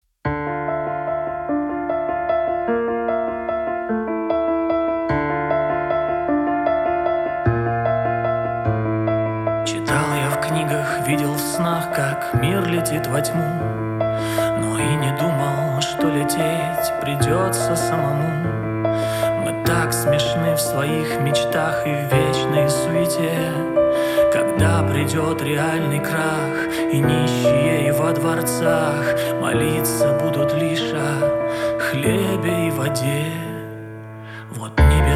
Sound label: piano version